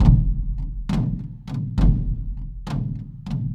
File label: Java Lom Bak Drum Harmo, Ethnic Percussion; Sonic Foundry (Sony Creative Software); Ambient Atmospheres & Rhythms